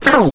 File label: backscratch, scratches; Zero-G; Freak Beats